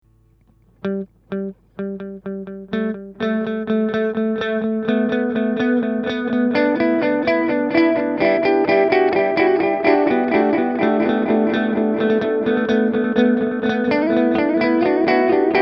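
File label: hologram electronics microcosm guitar pattern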